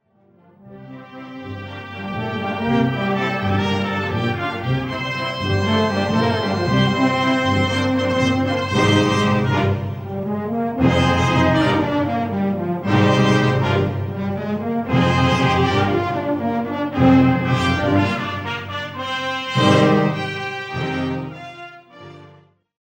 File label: concert prelude